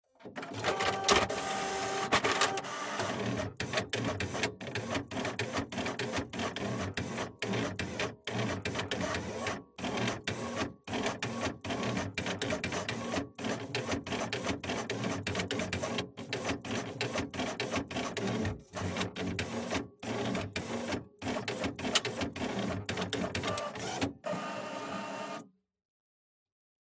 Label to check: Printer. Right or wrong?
Right